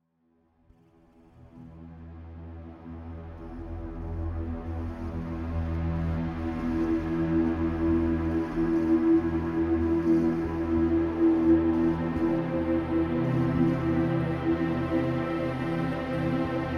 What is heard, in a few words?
even darker drone